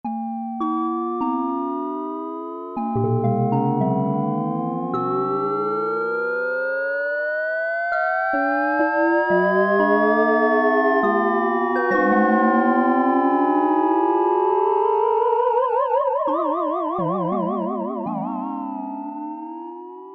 FM, Oberheim OB-8; Factory Patches

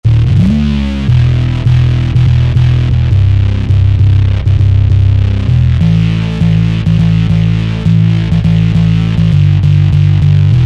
Obesity Bass, Korg TR-Rack

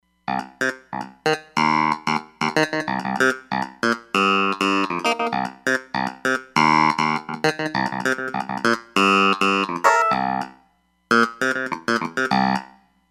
Vintage Clav, Roland Fantom XR

